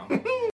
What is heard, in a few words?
laugh; Sims 4